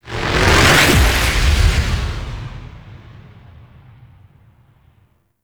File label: Ship Fly By Quick; Vehicles; Warframe; Liset; Liset Flight; Grineer Ship Liset